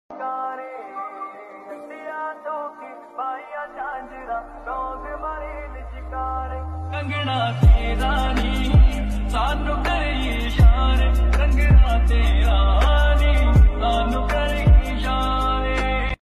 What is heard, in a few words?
lofi mix ringtone panjabi